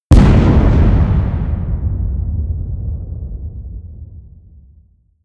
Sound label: big explosion